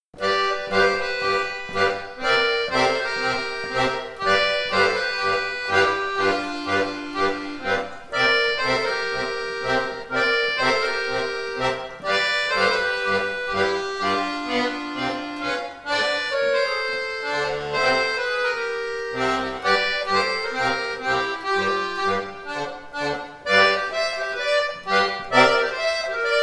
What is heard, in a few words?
Blues time; konzert 2024